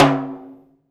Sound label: TIMBALE, HR-16 Drum Mashines; EdgeSounds